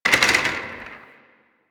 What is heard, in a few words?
Office Chair Impact; Warframe; Ambience; Nineteen Ninety Nine